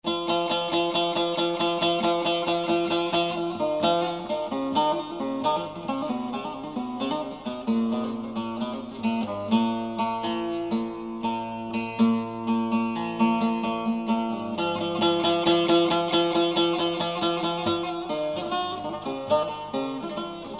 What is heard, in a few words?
Solo saz